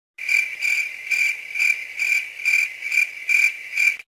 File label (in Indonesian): suara jangkrik krik krik garing